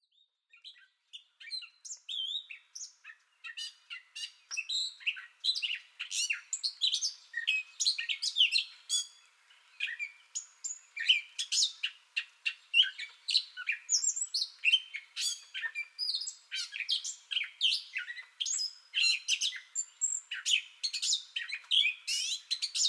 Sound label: Gray Catbird Song Bout Already Going